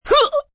Grunt Punching, Grunt, groan, impact, male, with gasp, pain, hurt (human voice); Groaning; VoiceBox